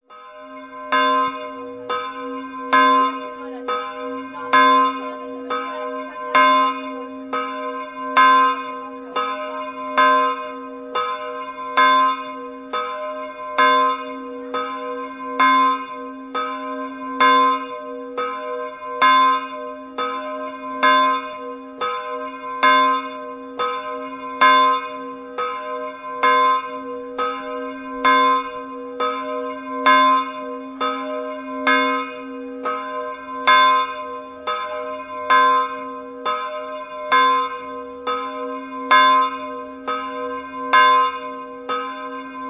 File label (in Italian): campane; salette